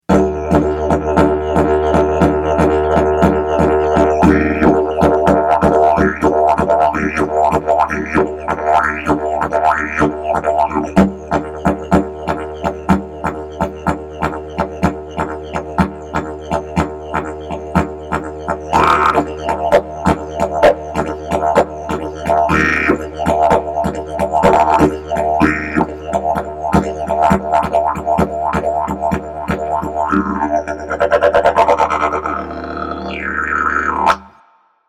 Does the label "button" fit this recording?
No